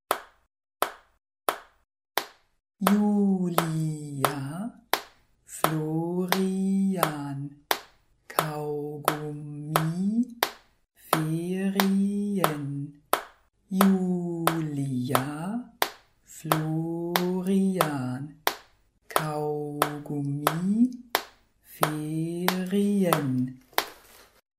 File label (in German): Klatschuebung